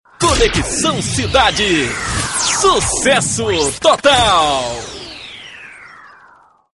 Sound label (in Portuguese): vinheta